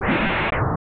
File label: Swoosh